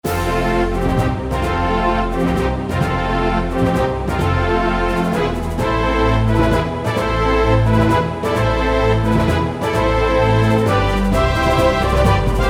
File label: Victory; warcraft 2